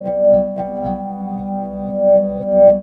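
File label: Pad Synth; Equipped Music; Loungin House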